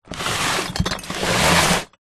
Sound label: foley bottles glass empty in cardboard box slide wood floor